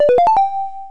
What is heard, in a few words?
harp